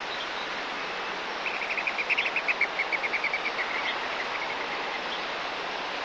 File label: bird